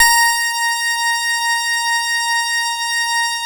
A#, PIANO; Big Fish Audio; Synclav FM

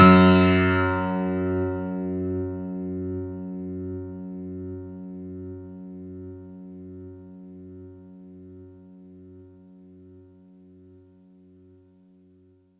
F#1, synth; 90 s Sample CDs; NiceBeats; SynthLine VOL-26

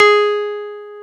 DX EP; INIS; Vintage

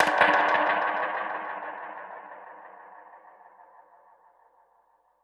Perc Hit, dub percussion

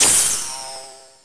Ball Flies In; SNES; Bill Laimbeer's Combat Basketball

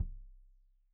Kik Stereo; Deeply Sampled Drums

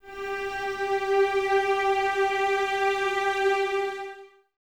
CES G 4, Cellos, String Ensembles; Miroslav Vitous; 90 s Sample CDs

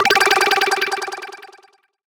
Sci-Fi Lasers - FX Sweeps; Antidote Advent

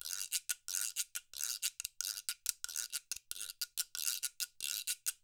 Percussion works loops; Sampleheads; NYC Percussionworks; ST LOOPS 92

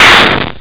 explod; ST-XX Amiga Soundtracker Sample Packs

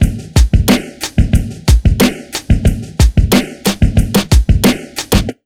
91 BEAT, Underground Hip Hop; USB Soundscan vol.02; AKAI

